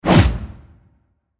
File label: atk punch